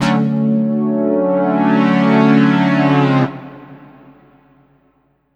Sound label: CHORD, Drum & Bass; Ueberschall; Drum & Bass Resonance; 168 BPM